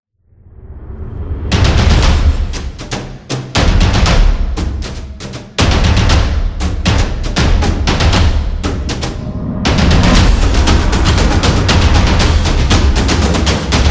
Tribal Drums Epic

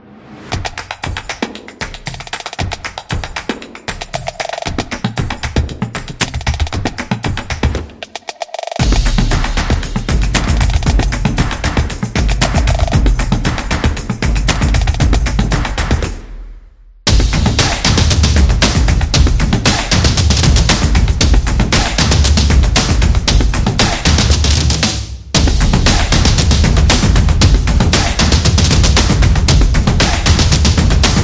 Drumming Claps Upbeat Beat; football promo

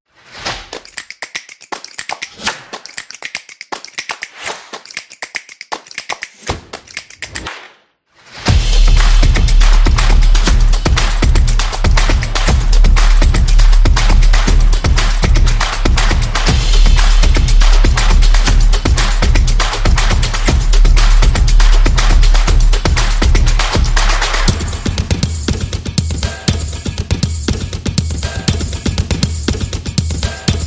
Energetic Promo

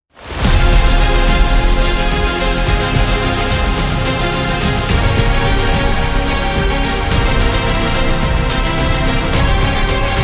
Tragic; epic sport metal

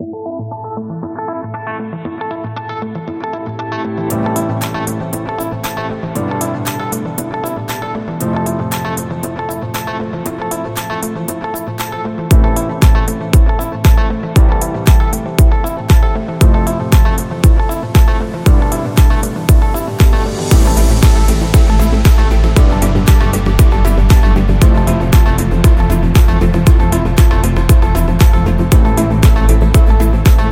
Interview Background Music

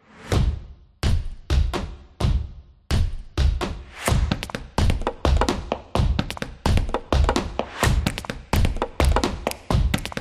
Step, drums energy

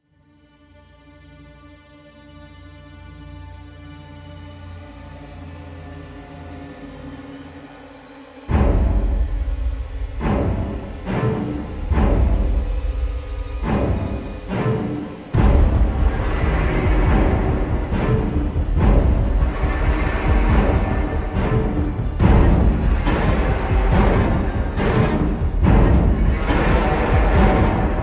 Countdown Horror

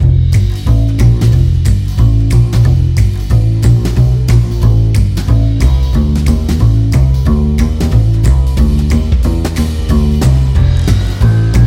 Jazz Band; jazz club

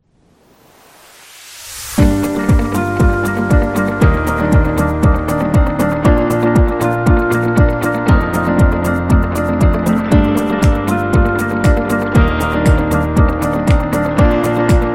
Inspirational Corporate; corporate music vol 4

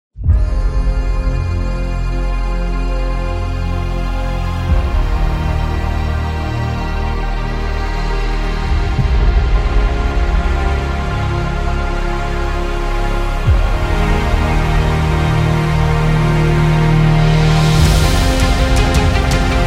Devotional

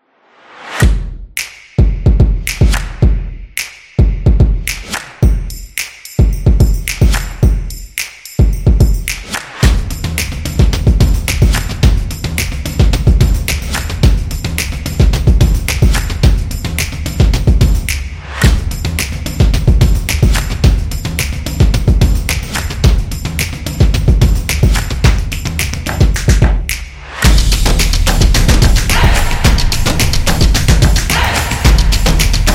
This Is Drum Rhythm, stomps and claps

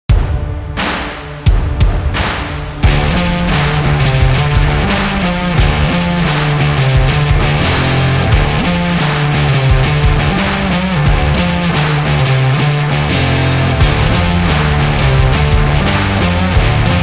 The Stadium Rock

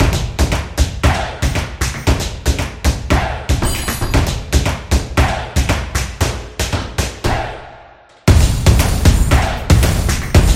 Upbeat Drums Beat

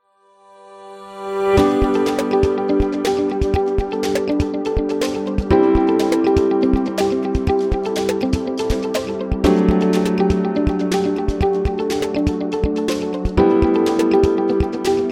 Be Successful, happy music

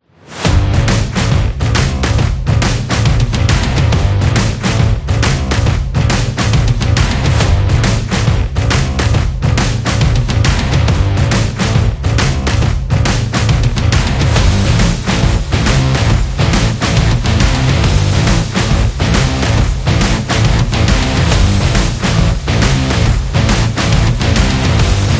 Sport Rock Indie; electro rock

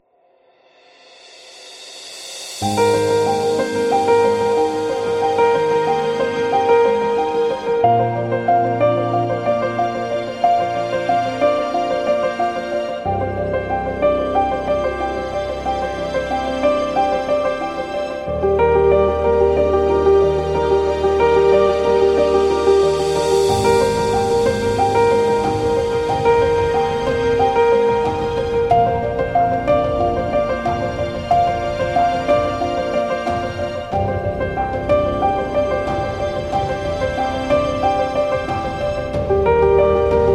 Piano Ambient

